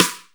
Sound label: Snare, Akai MPC500